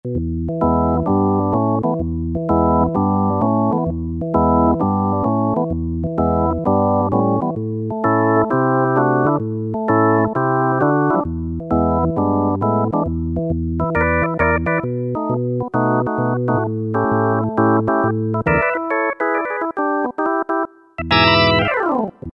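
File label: Electric Organ, Roland D-110; Factory Patches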